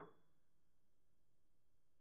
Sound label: piano pp, steinway